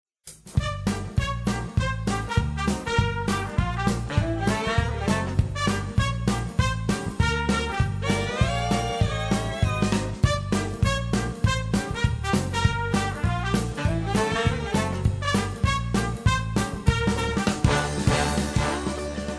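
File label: swing big band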